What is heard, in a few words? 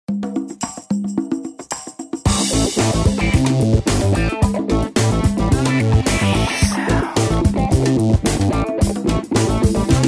Electric Funk - 110BPM, Rock